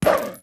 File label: Wolf Lunge; Wolf Link